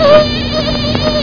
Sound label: Mosquito